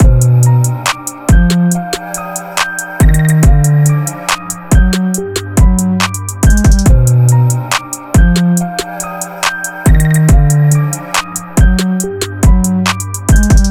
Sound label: Trap